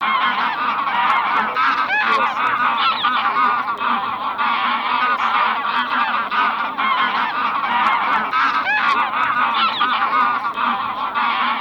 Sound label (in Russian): Гуменник базар Oche Granaiolle; plurifon